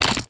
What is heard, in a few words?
ice inventory pickup, factorio sound item